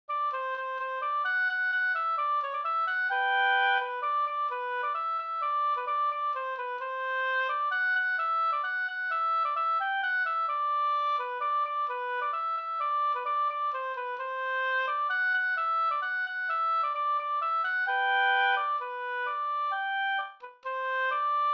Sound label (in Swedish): folkdans, Tjeckisk polka Gdur; Sternpolka